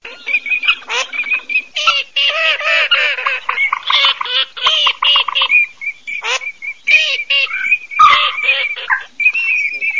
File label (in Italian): ALZAVOLA GALLINELLA